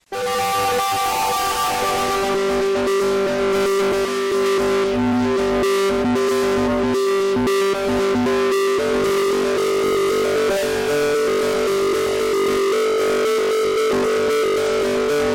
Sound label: wii menu earrape